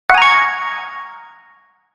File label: Synth Bell